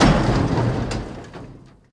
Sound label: damage vehicle; World Of Warships